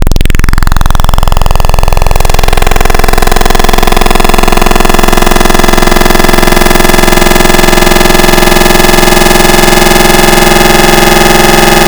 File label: Round Up The Saws, FM Wavetables; Cymatics; FM Wavetables Vol 2; Serum